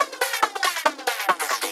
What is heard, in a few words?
Fills, Loops 140 BPM